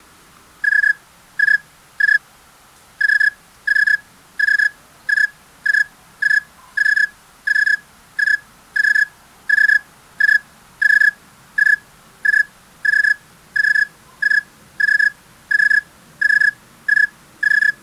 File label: Cricket